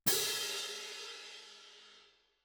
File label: Perc